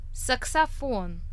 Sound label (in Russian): saksofon